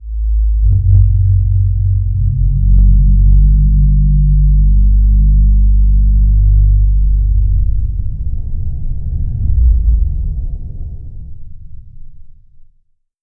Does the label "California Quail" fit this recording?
No